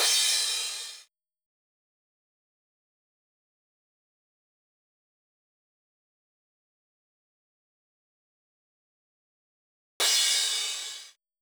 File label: pattern 1+2 crash